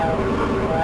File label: eta fly; gmod; star wars vehicles episode 1